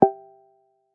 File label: face unlock success; HAPTIC SEC FACEUNLOCK SUCCESS; Sharp; AQUOS sense8 SH-M26